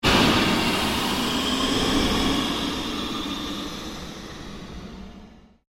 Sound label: Created Pulse Sweep, Sweeper Sound Effects; Sound effects created by manipulating other sounds